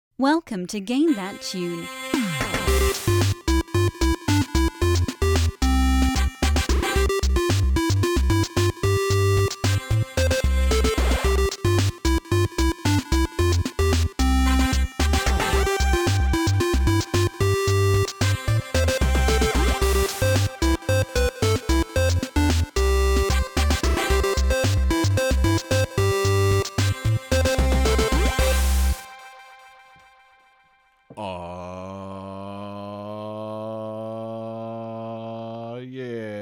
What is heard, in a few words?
arcade sfx